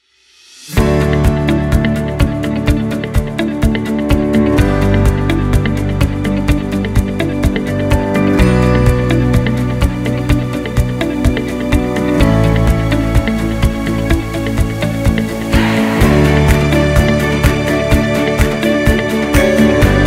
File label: Modern Corporate & Business Background Music Presentation Music Instrumental; Going Under